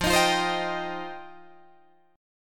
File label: F 7th Suspended 2nd F Guitar DADGAD; F7sus2; strummed